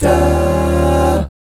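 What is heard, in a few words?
E flat 13, Jazz, 2 GUY CHORDS; ILIO; Vocal Planet VOL-3; Jazz & FX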